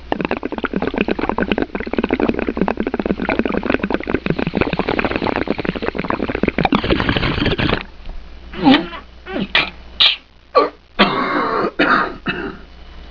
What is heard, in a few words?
bong